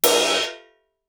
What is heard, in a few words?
ride choke crash, Suspended Cymbals; Percussion